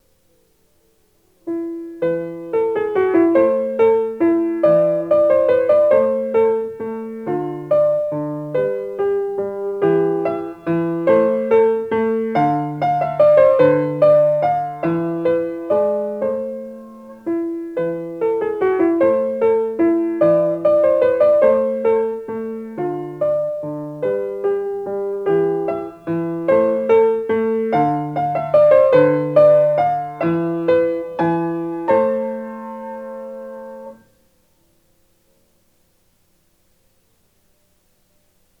Spanish Song

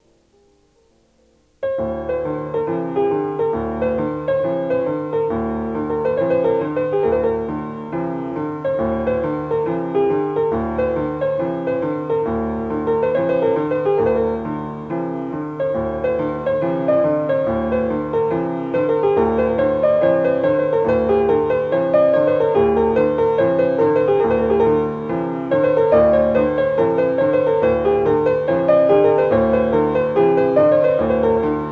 Macedonian Mountan Dance

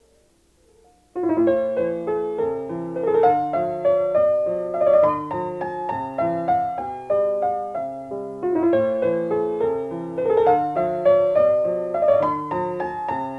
Waltz

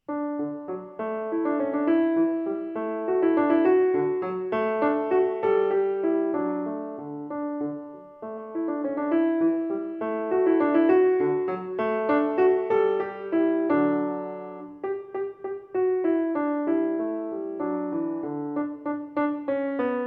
Deutsche Tanz

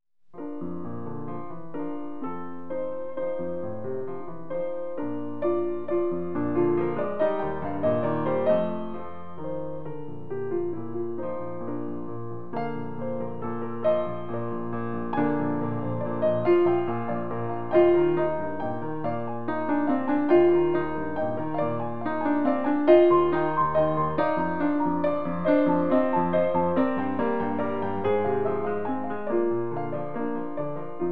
Piano Andante

